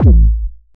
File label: tight lows kick single shot A key, kick single shot tuned to the A note; kick drum samples